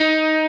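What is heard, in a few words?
Arpeggio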